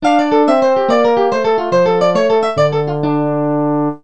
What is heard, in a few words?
Medieval Bridge; Music